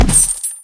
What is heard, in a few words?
ammo box touch